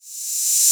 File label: 808 OHH Rev